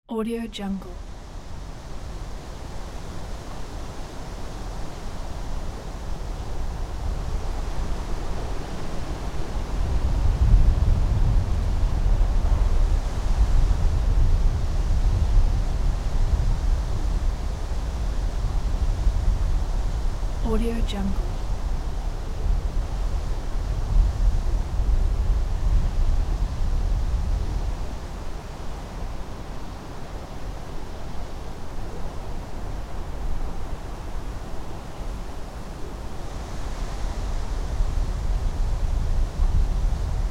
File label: wind